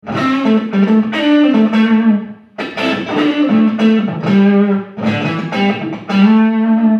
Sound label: lead, amp; lunchbox